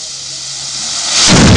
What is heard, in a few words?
Medieval Cannon Shot